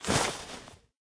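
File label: inventory items generic; STALKER; IWP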